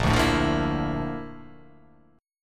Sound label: Bb Major Sharp 11th Bb down, chord; strummed; JGuitar